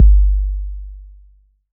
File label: Not Nice 808's